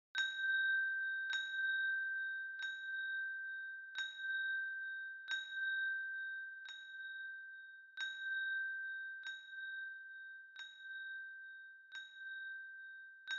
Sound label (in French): La Clochette